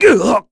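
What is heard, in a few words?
Vox Damage, Korean; Bernheim; kingsraid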